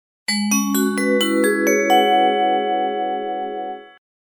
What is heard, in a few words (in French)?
Melodie Xylophone aigu